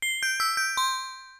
doors; Mission Python

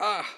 ouch; Mission Python

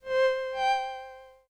pickup; Mission Python

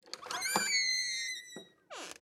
Open Door; Game Development with Blender and Godot

